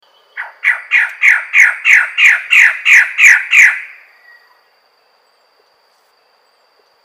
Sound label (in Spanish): audio sonido pajaro del paraiso magnifica Cicinnurus magnificus